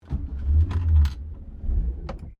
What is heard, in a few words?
Car Holden HD Premier 1965 interior ignition, classic Australian cars; Vehicles